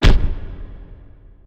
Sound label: Ogre Thud